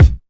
smooth bottom end kick e key, Royality free kick drum single hit tuned to the E note; kick drum samples